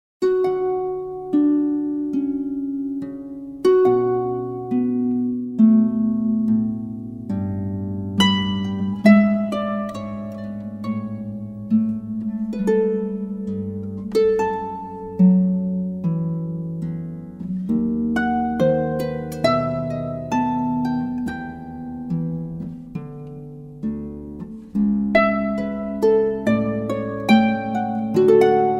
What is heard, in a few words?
Harpist